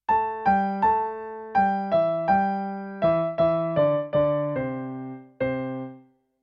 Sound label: pentatonika; primer